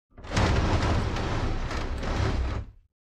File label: Large Chest Slide